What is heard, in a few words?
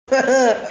hehe